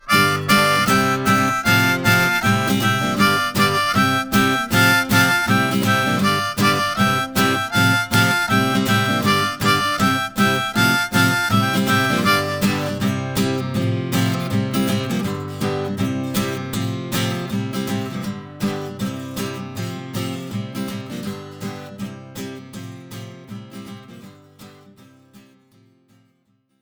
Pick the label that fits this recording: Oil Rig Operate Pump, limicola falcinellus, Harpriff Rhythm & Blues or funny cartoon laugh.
Harpriff Rhythm & Blues